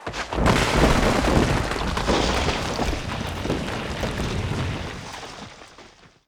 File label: building collapse large; attack; destruction; 0AD